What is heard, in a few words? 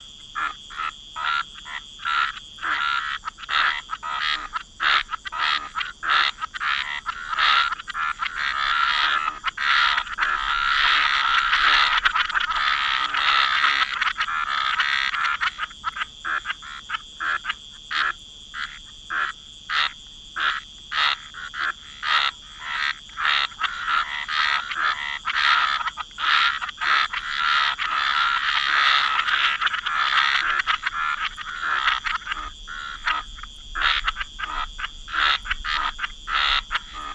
forest night; Nature; Loops